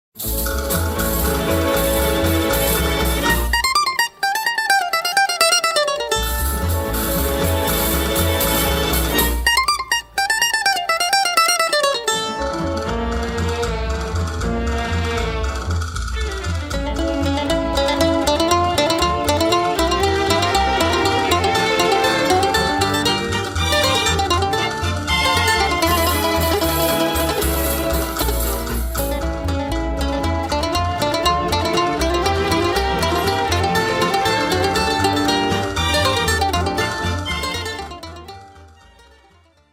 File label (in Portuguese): Bandolim